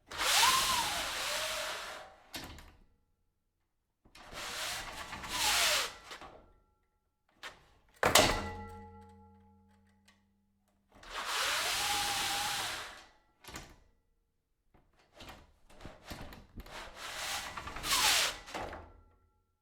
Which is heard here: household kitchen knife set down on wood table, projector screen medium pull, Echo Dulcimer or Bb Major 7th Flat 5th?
projector screen medium pull